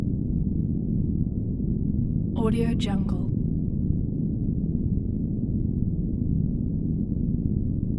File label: scifi spaceship interior reactor room background loop